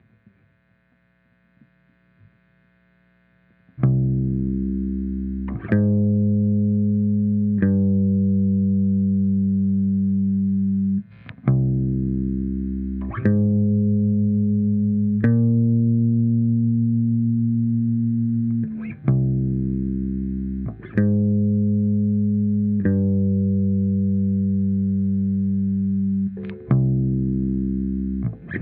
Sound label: bass; spinebashed; Bridge; 118bpm